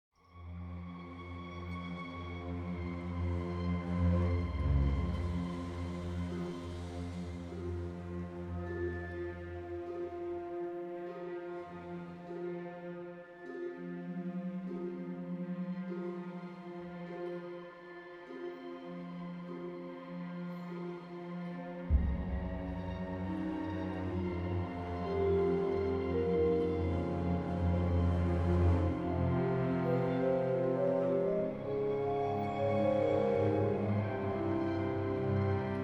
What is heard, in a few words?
World Music